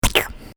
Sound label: Splat